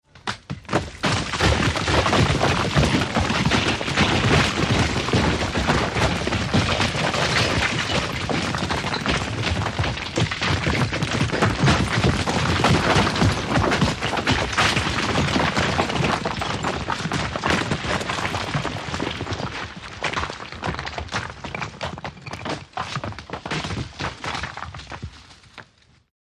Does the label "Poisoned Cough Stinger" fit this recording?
No